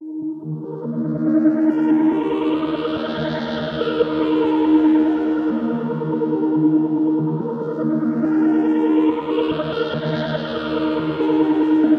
Digital Brain Atmosphere D# 142 BPM, Psytrance

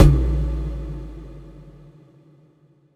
Impact; House; FX; Impacts; Jungle Impact

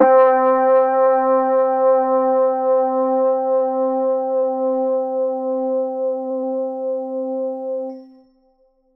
Synth C, Lo-Fi; HipHop; Melody Kits; One Shot; GhostHack; Ghosthack - AC2024 - Day 5 - Melody Kits; Gone 76 Bm

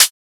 Closed Hihat, Techno; Drums; Drum One-Shots; Ghosthack; Free Techno Drum Shots 2024

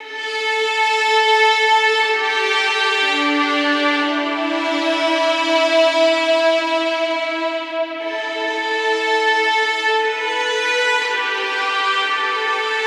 Stringed Instruments Solo Violin Am 60Bpm; Ghosthack; SkyRealm

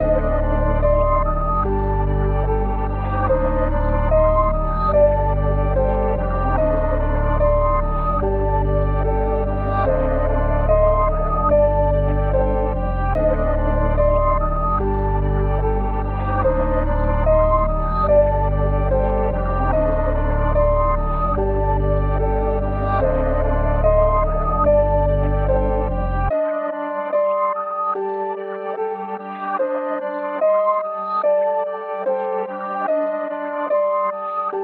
146 BPM G Min, Drill; Meet The Reaper

